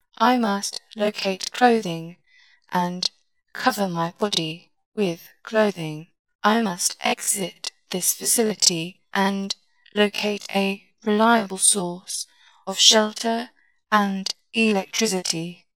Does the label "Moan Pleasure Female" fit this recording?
No